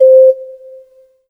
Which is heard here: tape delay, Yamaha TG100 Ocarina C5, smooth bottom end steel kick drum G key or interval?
Yamaha TG100 Ocarina C5